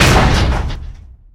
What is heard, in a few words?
big metal, metal box collide